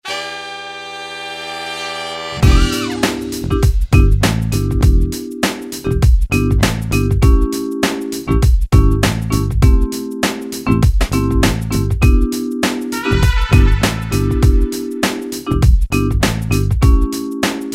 funky

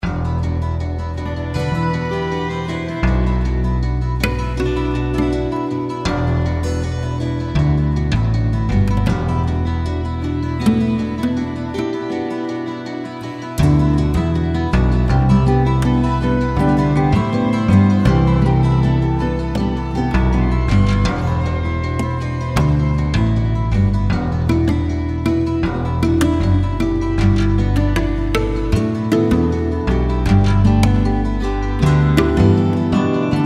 GUITAR

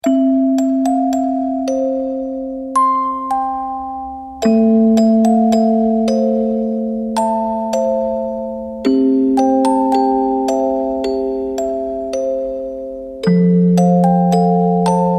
MUSICAL BOX KID

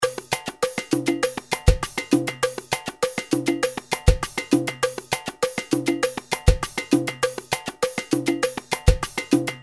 MANBO BEAT

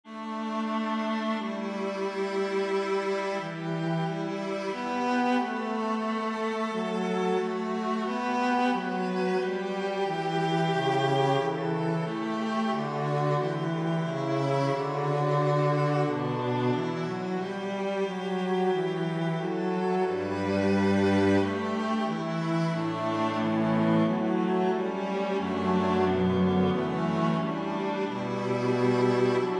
cello alto music

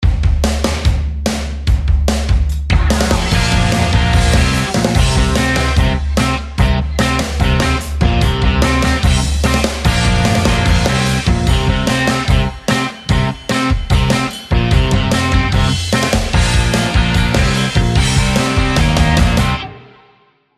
punk

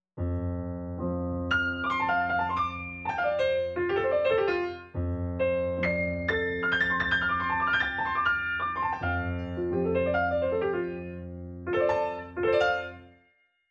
TRANSITION PIANO

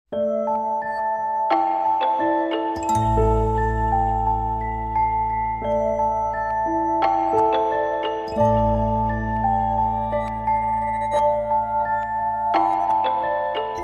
GLOCK AND TINES

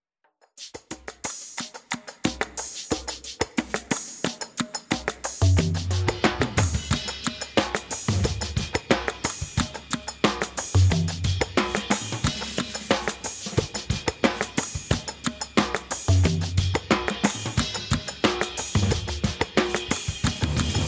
HAPPY HANDS PERCUSSIONS

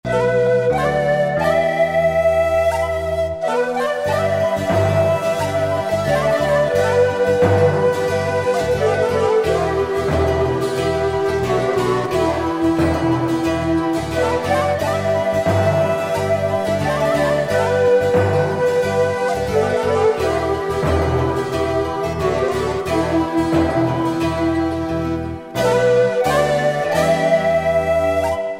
ANDES FOLK

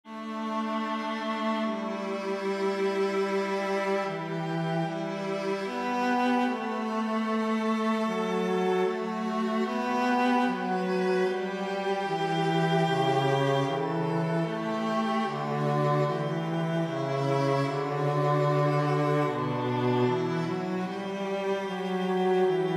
CELLO ALTO MUSIC TWO

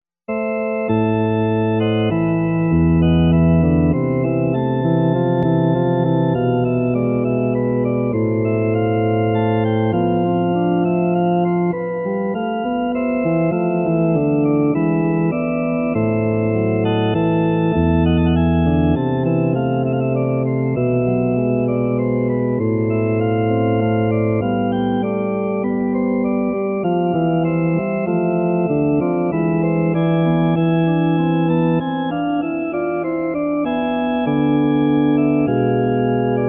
SARABANDE GRANDE ORGUE

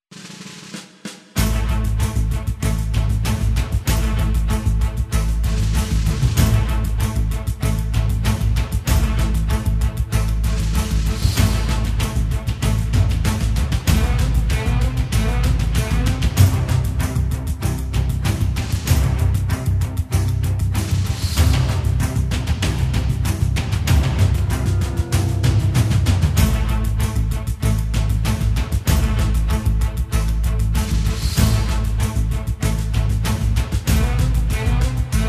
MILITARY ACTION

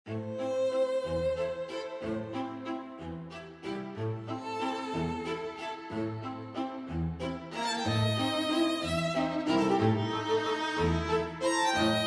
WALTZ

